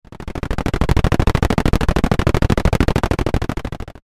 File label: Old machine